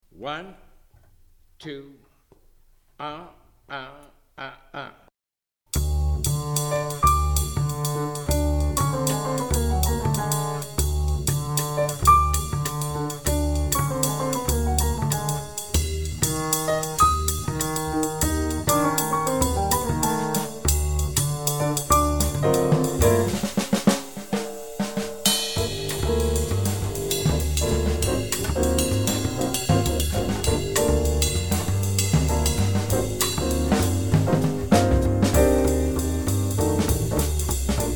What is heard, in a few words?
minus melody